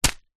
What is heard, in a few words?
food bag of rice dried catch; zapsplat